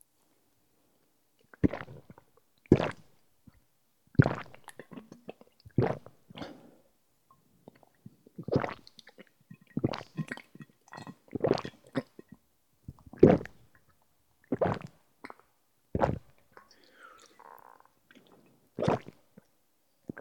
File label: Man Drinking Water